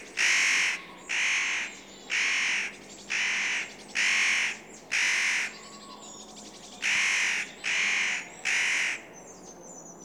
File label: chant nucifraga caryocatactes